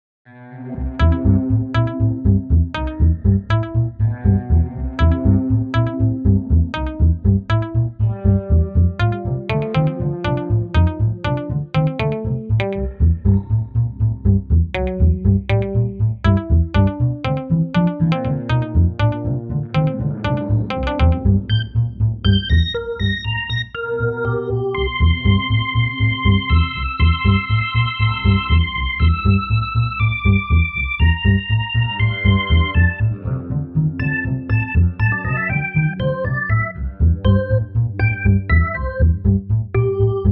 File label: MIDI blues; spellman studio